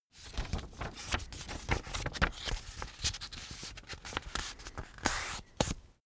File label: sheet fold